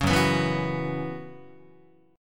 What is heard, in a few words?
Gb 7th Suspended 4th Gb down Guitar, chord